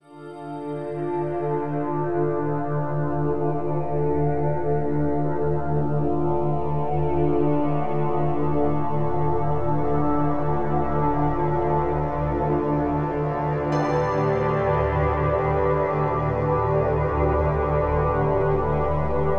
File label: Deep Relaxation Theta Waves